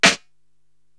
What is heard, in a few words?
SD Bryte Trip, Korg TR-Rack drum machine